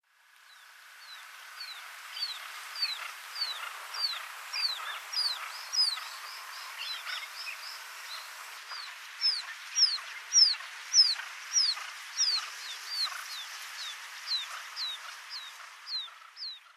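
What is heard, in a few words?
Barred Buttonquail; mifuuzura; itoman; koe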